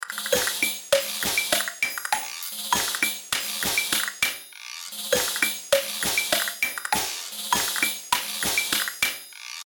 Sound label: Orchestral Sample Pack